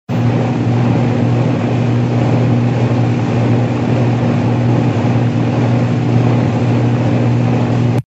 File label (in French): clim; nuisances sonores